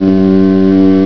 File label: FOG